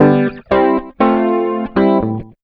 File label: rock, acoustic guitar loops; USB Soundscan vol.04 - Electric & Acoustic Guitar Loops; AKAI; 120B.ROCK